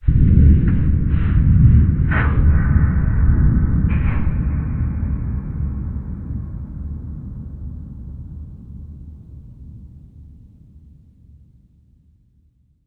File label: big hit far